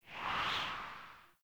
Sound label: Player Pre Landing Wind Gust; Falling